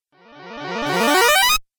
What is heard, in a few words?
Rising Video Game